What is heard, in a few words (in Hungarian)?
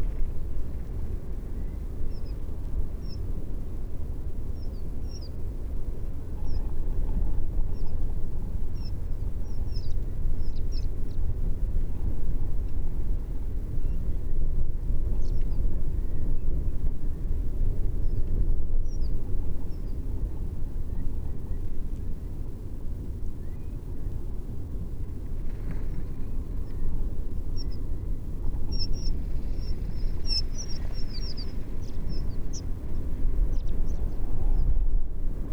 havasi fulespacsirta hangja